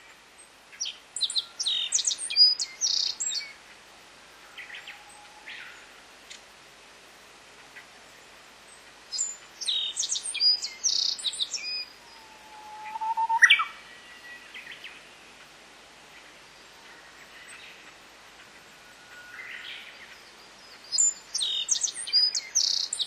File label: black bunting